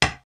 wood; RPG

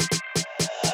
Snare Fill, Drums; BB 2HTC Samples Vol 4

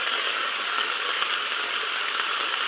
noise; cc0 sfx loops

